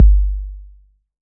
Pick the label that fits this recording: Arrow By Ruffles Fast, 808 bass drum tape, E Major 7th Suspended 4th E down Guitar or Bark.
808 bass drum tape